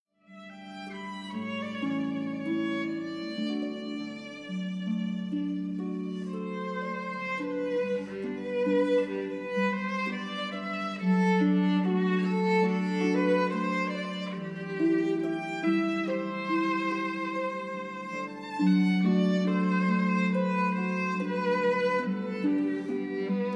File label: Harp Violin; Wedding Ceremony; Ceremony Musicians